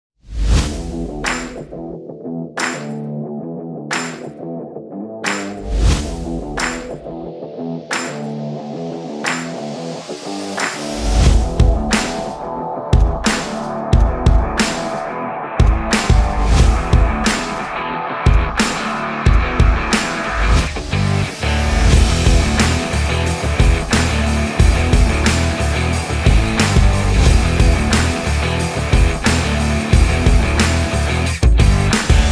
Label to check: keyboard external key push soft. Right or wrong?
Wrong